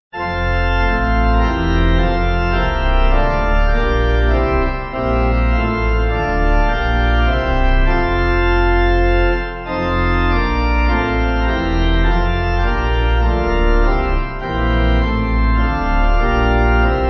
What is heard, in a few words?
Pipe